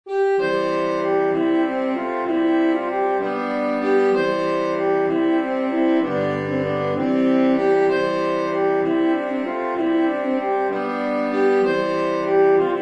Saxophone; Byrd; Wolseys Wilde

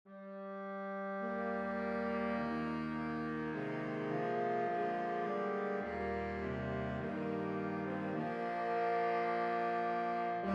Saxophone; Grainger; Colonial Song

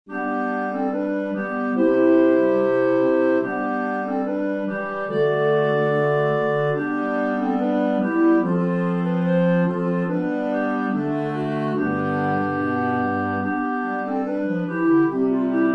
Clarinet; Byrd; John Come Kiss Me Now

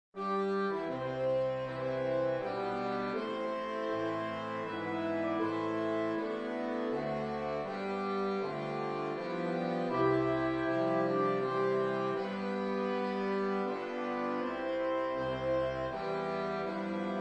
Sax Ensemble; Star Spanged Banner

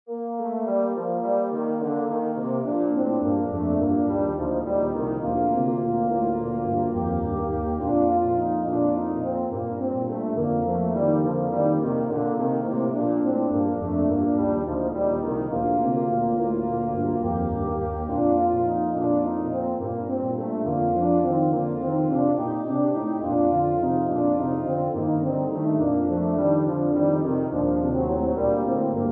Low Brass Quartet; Bach; Bwv608; In Dulci Jubilo

